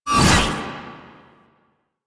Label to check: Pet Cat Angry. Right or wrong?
Wrong